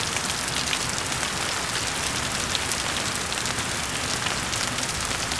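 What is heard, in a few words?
rain loop; Counter-Strike Global Offensive; weather; aztec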